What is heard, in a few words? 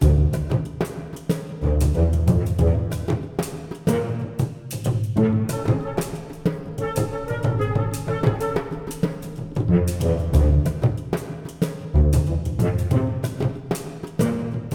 jungle loop; TinyKeep